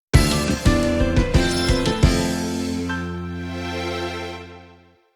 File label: jingle take a breather